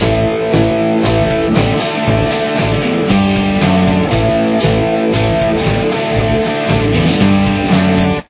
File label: chorus, guitar